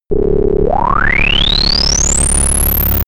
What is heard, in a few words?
signal push; digital